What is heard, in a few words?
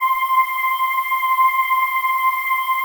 PAD, DIGI-PADS